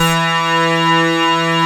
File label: OB KNIFE E3, OB Synths, Analog; E-MU Formula 4000 Series Vol. 3; Analog Odyssey